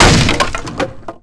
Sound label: hit wood planks; hits; Tony Hawk's Project 8; XBOX